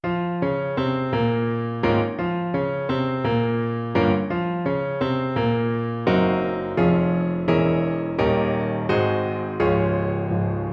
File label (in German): Klavierkoncert Nr 1 Bbm Op 23; Tschaikowski